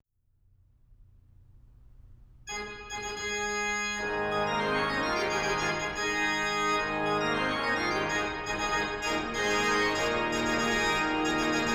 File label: Fanfare For Reeds And Other Festal Stops